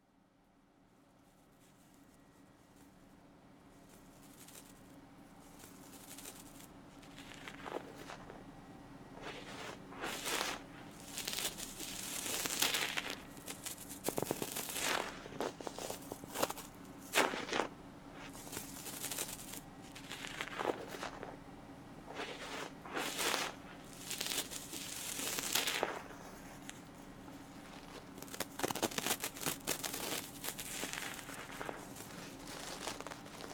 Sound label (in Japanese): 竹耳かきでスッキリ（竹耳かき・左耳）ASMR; 王道！竹耳かきでスッキリ